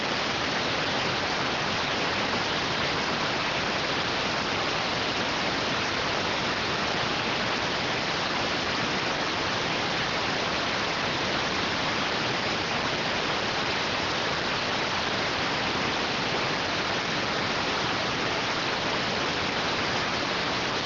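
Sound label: radioteletype receive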